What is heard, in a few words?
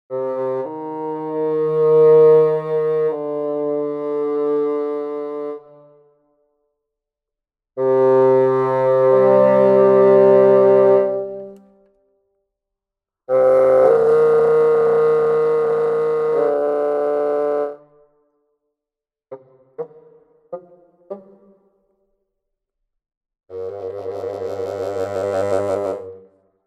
Symphonic Woodwinds Bassoon